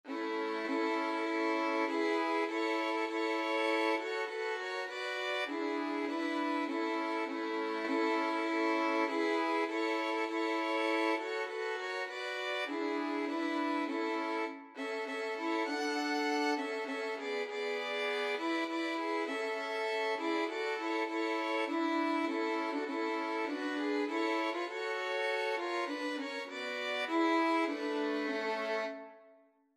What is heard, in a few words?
violin trio; christmas trees